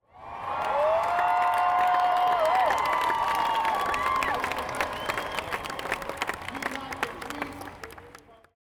Crowd Cheering Exterior, Political Rally Large, Medium Surge; 5.1 LCRLsRsLf; Surround at the Show 2